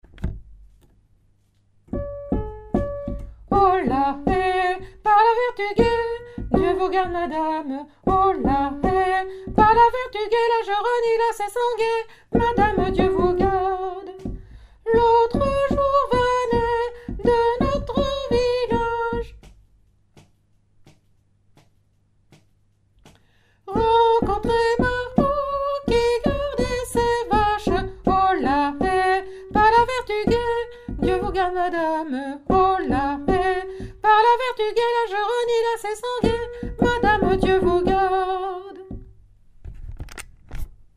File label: hola he soprano